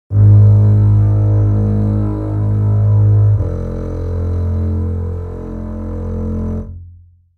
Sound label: Bass Fade in cello